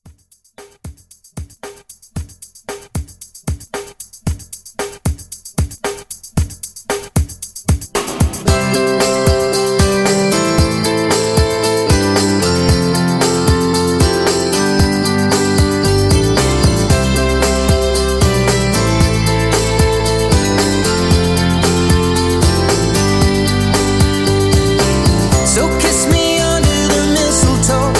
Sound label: Christmas Re Drum, 114